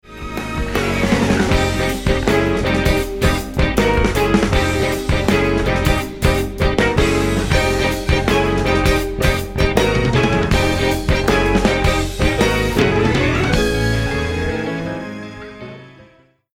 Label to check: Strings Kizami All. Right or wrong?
Right